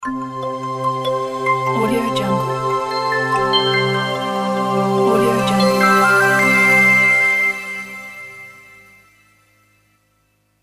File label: weather report intro; rtofvnt